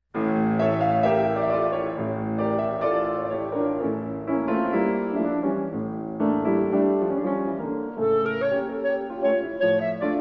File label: Hebraic Music for Clarinet